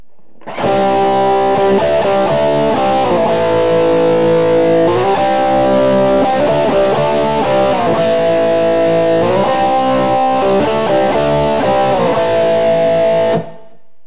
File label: bridge crunch; burny; fugly clips